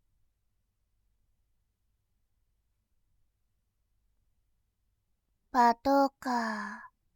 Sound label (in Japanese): パトカー, ASMR